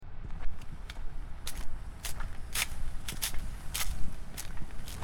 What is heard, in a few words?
footsteps in puddles